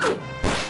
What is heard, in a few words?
Attack - Poison Moth Knife; DQIV iOS SFX